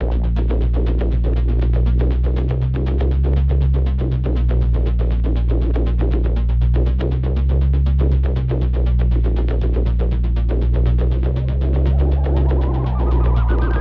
Synth Blade Loop

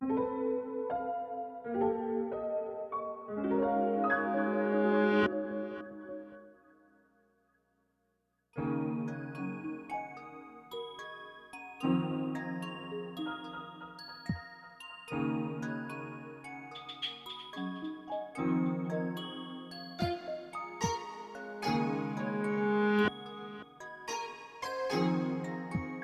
Rainfall (Noon, Carrying); Pikmin 3

